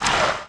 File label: Ghost